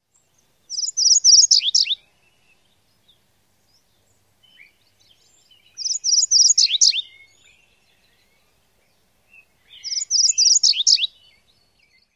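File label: songs, MacGillivray's warbler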